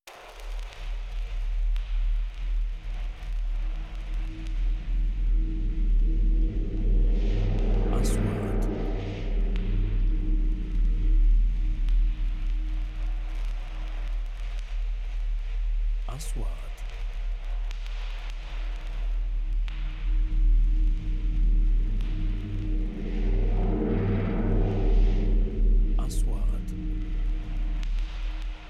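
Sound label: 3D SFX Trailer; مؤثرات تشويقية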